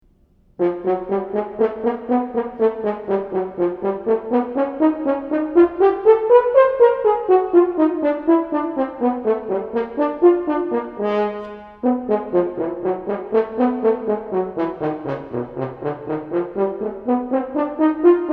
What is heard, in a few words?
horn; harmony kopprasch